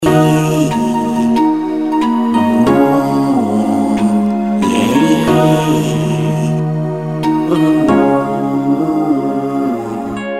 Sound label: Sad Man Song